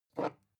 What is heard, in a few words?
glass pick up smooth fast; objekte